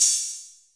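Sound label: 8000 hi-hat, Roland CR-8000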